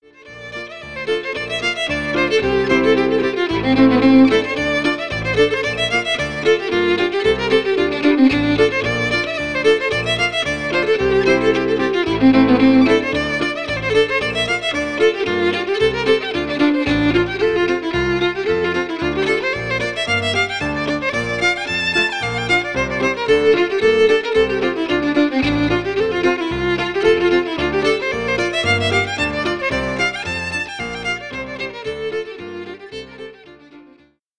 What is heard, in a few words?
Reels